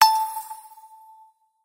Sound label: fail